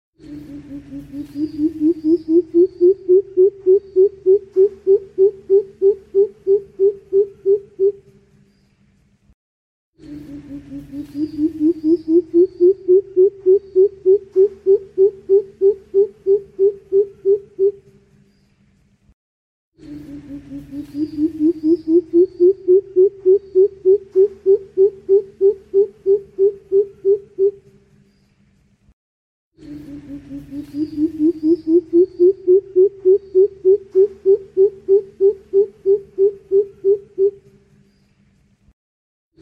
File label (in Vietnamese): Tieng Cut keu chuan